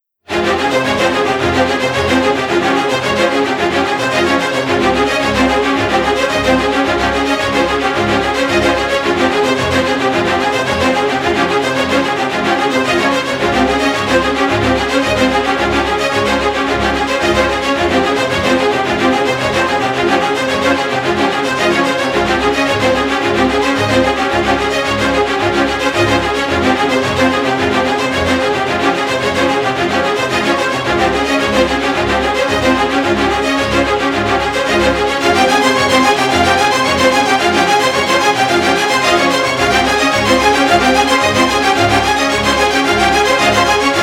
tension cinamatic violin; By Burak Sound Bank

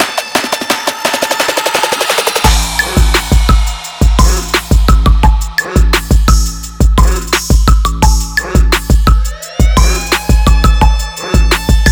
rnb; By Burak Sound Bank; r b hip hop rap tema paketleri vol 1

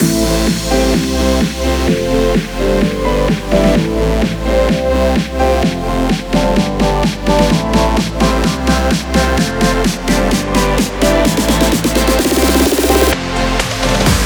house electronic techno; By Burak Sound Bank

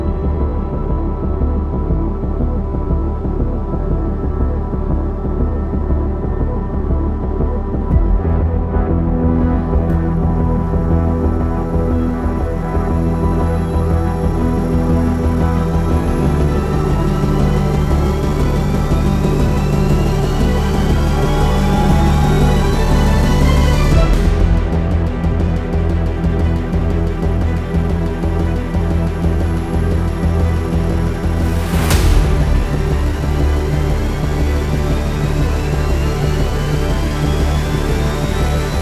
tension violin pad; By Burak Sound Bank

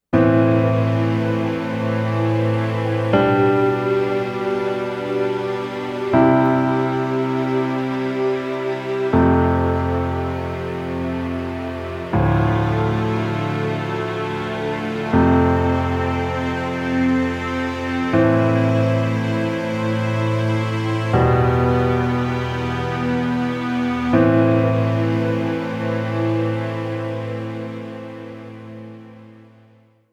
dramatic huzun; by burak sound bank; dramatic dram vol 16

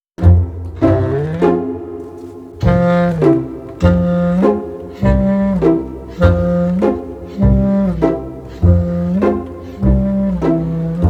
sweetness pizz; by burak sound bank; cheerful vol 14

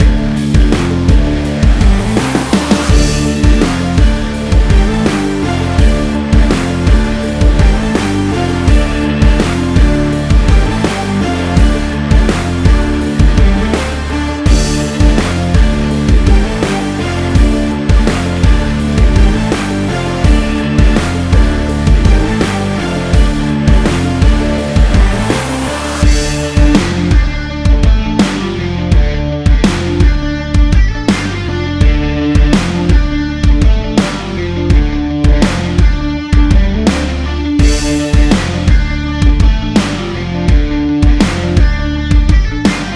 tension; By Burak Sound Bank; tension gerilim vol 23

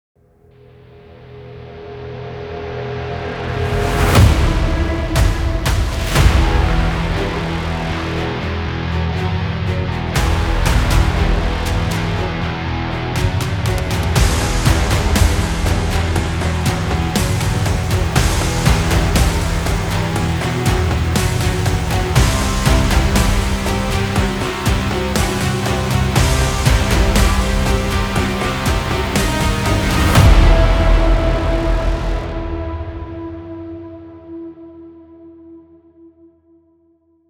tension aksion; tierra; By Burak Sound Bank; tension gerilim vol 21

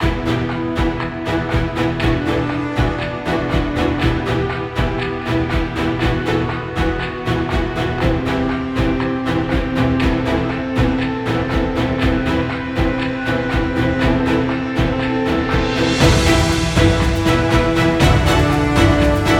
tension, horror excitement thriller song; By Burak Sound Bank

